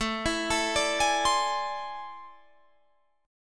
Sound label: A Minor Major 11th, down